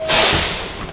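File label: Emergency Brake, Train; R220 MUE5 - 6 Car (Alstom) 2019-Present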